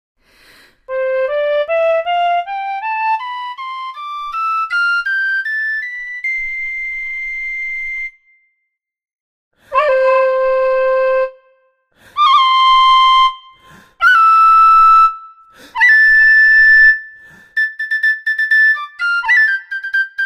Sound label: tinwhistle